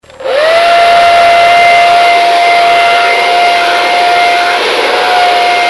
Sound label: big hair, machine motor